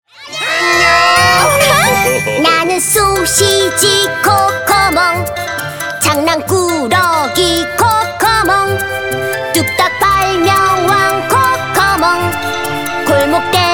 동요 쾌활하고 당찬 남아; 코코몽

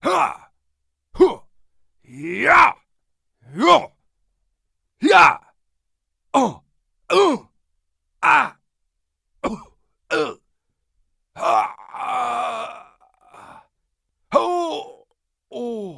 영어남, 호흡

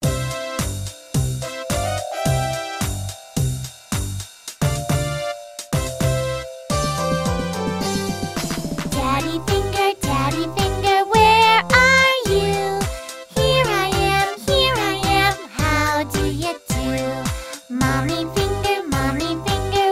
영어여 동요 여아목소리

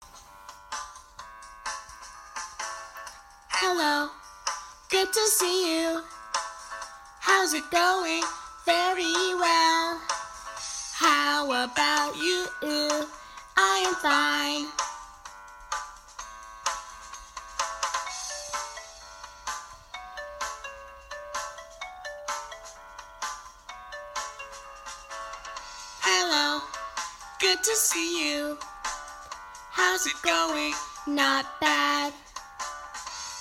영어 여 여아 노래

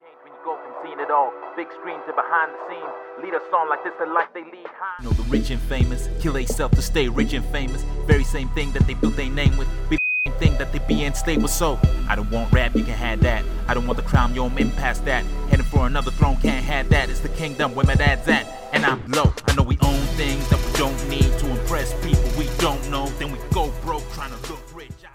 영어남 노래 (랩)

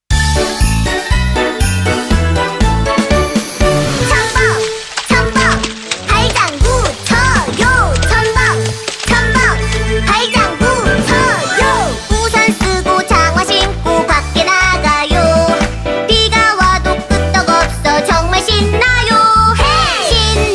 동요 신나고 리듬감 있는 남아

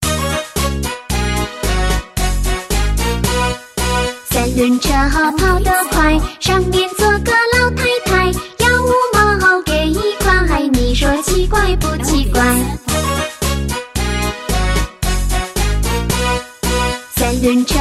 중국여 동요

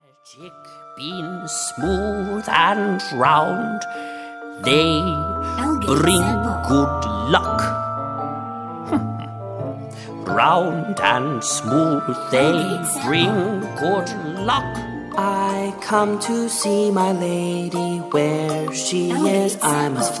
영어남 노래 케릭터; 남자

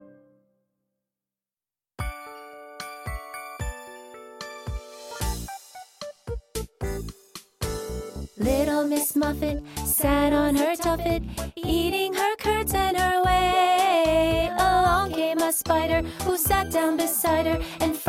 영어 여 노래

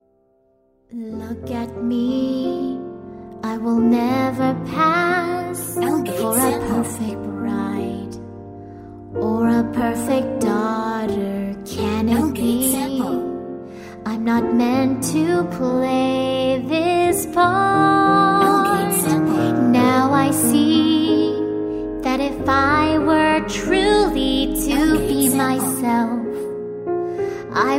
영어 여 노래

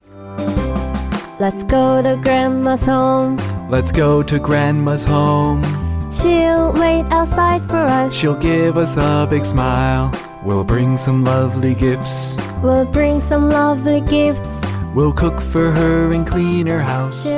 영어남 챈트; 남자

